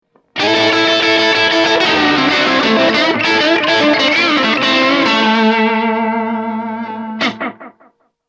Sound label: Slapback; Zerre